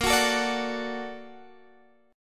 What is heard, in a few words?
A sharp Augmented Major 7th, guitar chord